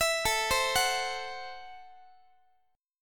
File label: A Minor 6th A, Guitar; x,x,19,19,16,17; arpeggiated; Am6